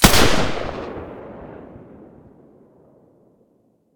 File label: silencer g36 mono, weapons